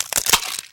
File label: egg crack; garrysmod